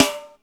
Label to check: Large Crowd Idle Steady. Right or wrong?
Wrong